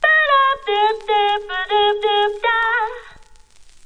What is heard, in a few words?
100 bpm Crackle Line; VOCAL OR VOXY LOOPS